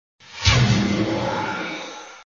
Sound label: Sound SFX, flipper; sttng